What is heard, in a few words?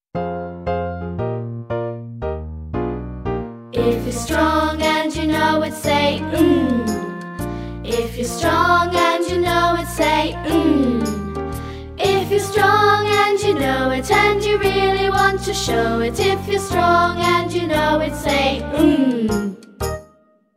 Jolly Jingle For Sound of ng